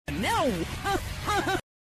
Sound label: haha one; reverse